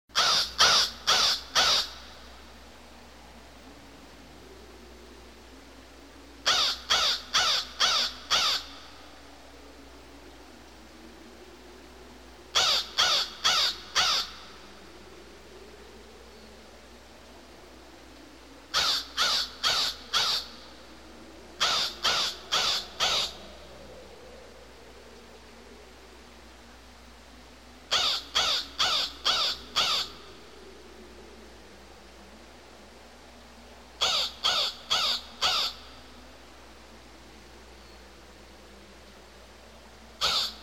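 zvuki prirody penie ptic vorona, nature sounds birdsong crow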